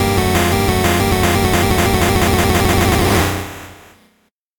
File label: 8bit jingle fast